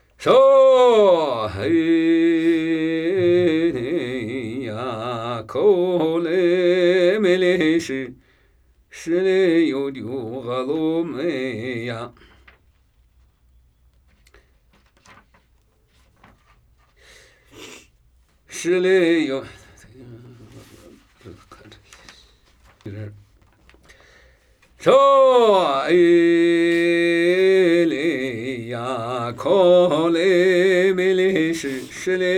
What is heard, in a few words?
Namuyi Tibetan Ritual Chanting; Drumi mu dzo tso su